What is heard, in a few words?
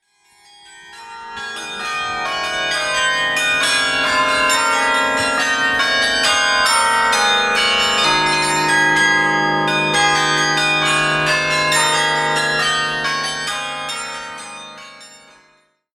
Carillon